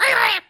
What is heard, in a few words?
Bird Yellow Selected; fowl